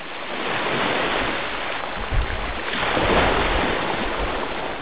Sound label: Ocean